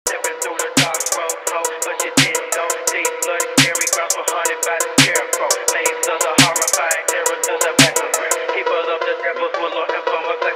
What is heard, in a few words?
Phonk Drift